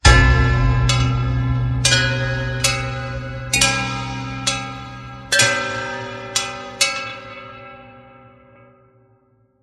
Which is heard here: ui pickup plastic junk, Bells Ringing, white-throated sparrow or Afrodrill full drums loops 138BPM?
Bells Ringing